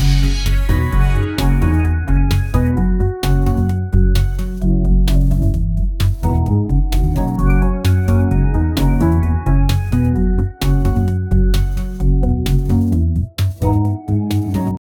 sound of upbeat positive, happy

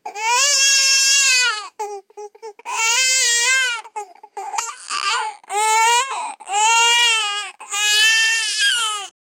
baby crying effect

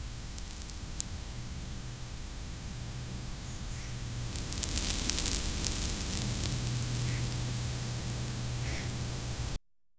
soft pop fine sand; sprinkle

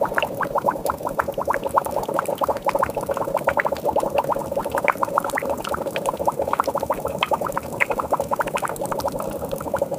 liquid bubbling, boiling

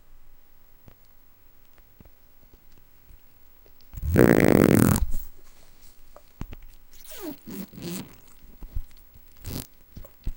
a crinkling diaper

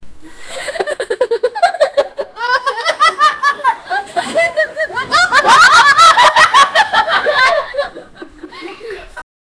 young people laughing

